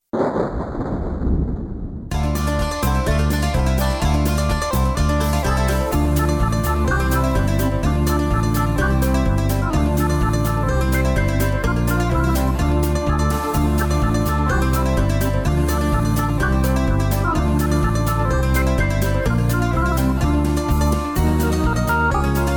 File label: mix with banjo, square dance music